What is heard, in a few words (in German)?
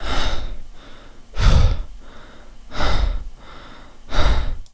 Atem, starkes Einatmen und starkes Ausatmen